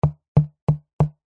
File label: cardboard box small knocking on side; foley